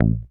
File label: HOFNER MUTE, Bass